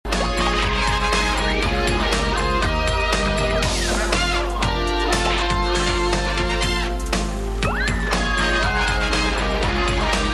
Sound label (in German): melodie; tele5; tv total